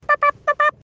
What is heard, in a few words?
Popup popup